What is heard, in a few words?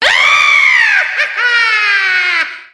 witch